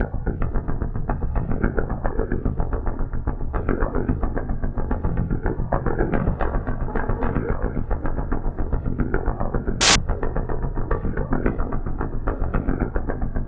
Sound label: SOLID WN BEATS; Zero G; Malice In Wonderland